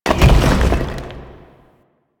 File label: Giant Rock Move Stop; Ambience; Man In The Wall